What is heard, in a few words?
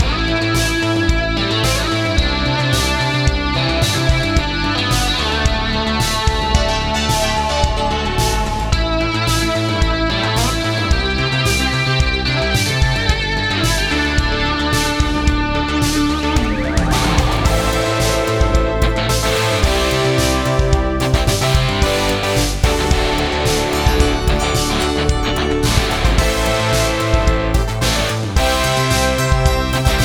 80s Boss; Cryptheim